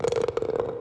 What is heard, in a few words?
ball in pocket success; Мини Гольф